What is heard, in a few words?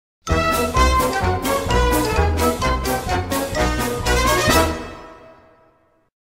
Stinger Win; Family Guy Back to the Multiverse